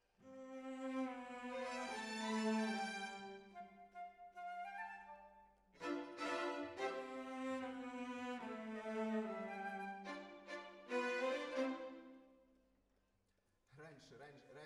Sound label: Decca, Jazz for piano